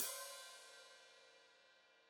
Hat Foot Open, Hi-Hat; Cymbals Stereo; Deeply Sampled Drums

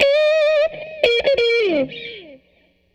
Tele Guitar Loop; Sonic Foundry (Sony Creative Software) - Six-String Orchestra

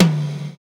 tom, Roland; Club-50 - Foundations Roland; Ext. Snare 4

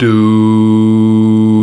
VOX, Male Scats

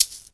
CABASA; AKAI S-Series CD-ROM Sound Library VOL-5

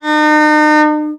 VIOL D#3, VIOLON, Arabian Traditions; USB Soundscan vol.43; AKAI

